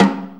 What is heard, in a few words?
Snare, Snare Drum; Drum Tools